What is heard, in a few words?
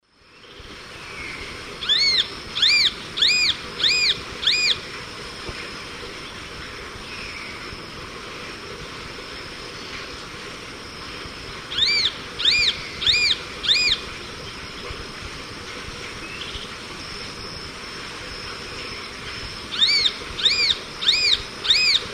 Buteo magnirostris, song; S. America; Eastern Lowlands